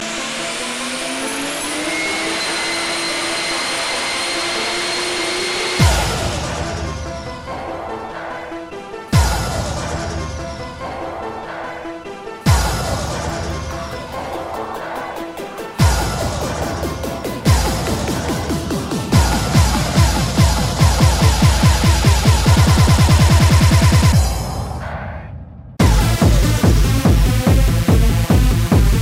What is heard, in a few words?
Rave Song